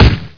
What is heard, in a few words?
mac10 shoot, weapons